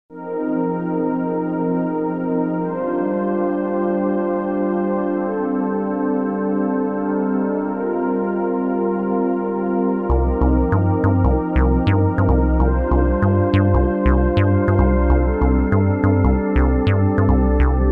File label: Irritation; never7; infinitymuseum